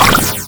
plasma ammo; serioussam2

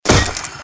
headshot kill

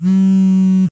vibrate, gphone

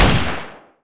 shoot, weapons

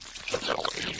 infector idle; flood

